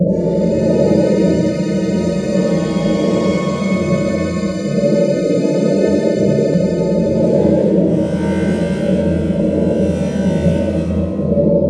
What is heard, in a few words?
combat ambience; gamerip; Hellsign